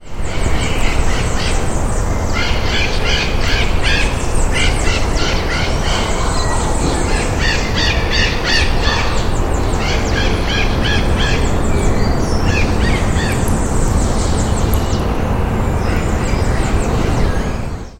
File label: Diopsittaca nobilis; Butantan; SP; Brazil